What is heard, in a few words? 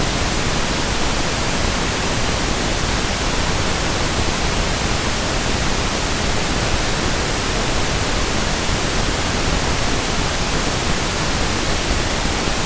Lab Grade Pink Noise; 20 minutes; test tones